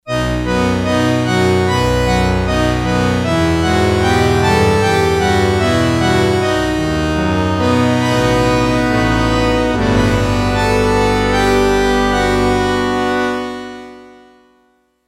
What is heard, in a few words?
Sy.Ensem., Yamaha DX11; Factory Preset Voices